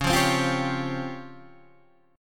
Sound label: C sharp Major 7th Flat 5th Guitar DADGAD